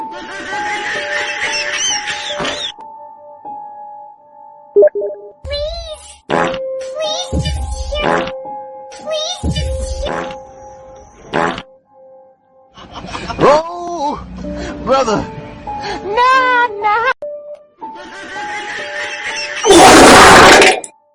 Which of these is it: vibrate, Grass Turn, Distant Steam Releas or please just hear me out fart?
please just hear me out fart